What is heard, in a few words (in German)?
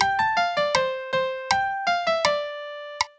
melodie; MelodiediktateII